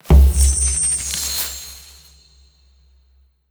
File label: cover drop